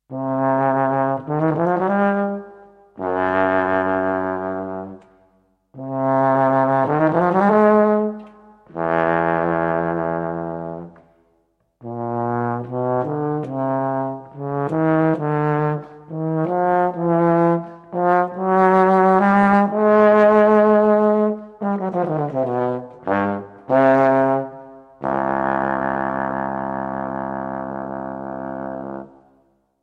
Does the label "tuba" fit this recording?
Yes